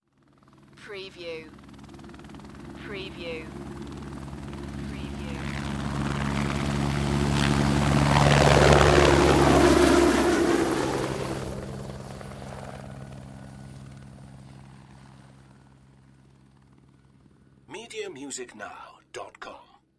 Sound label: Helicopter Fly By